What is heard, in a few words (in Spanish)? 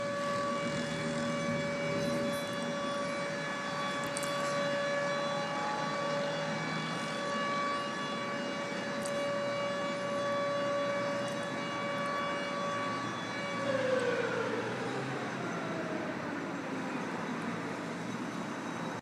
pito de las seis